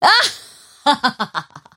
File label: mirana laugh; dota